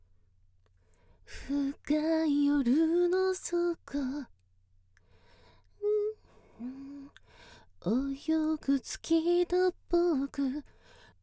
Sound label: Focusrite vocal